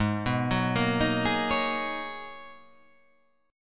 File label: G sharp Minor 11th G sharp, chord